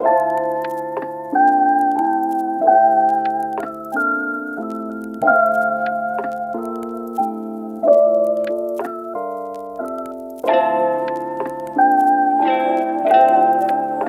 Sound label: Rain Drops Dm 92BPM